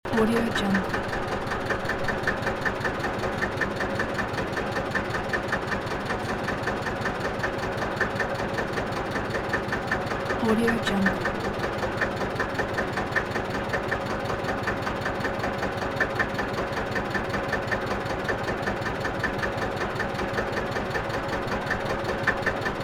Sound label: slow diesel engine idling loop